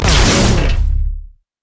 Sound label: crit attack; LoL; velkoz skin01